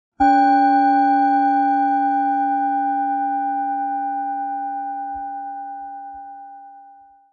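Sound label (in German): Planetenschale Biorhythmus Geist mit Klöppel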